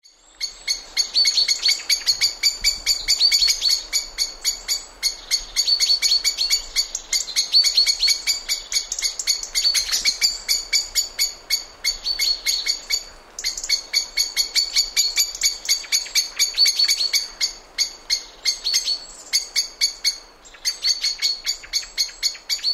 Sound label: mobbing